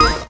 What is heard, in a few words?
lucky Reel Two; jewel fruits